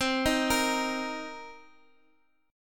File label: C Minor 7th, C, guitar chord